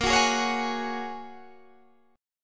A sharp Minor 7th Sharp 5th A sharp, Guitar DADGAD; A#m7#5; strummed; 20,21,18,18,21,18